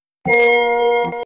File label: Windows 1.01-2.10 Startup & Shutdown; Windows Never Released Soundpack 1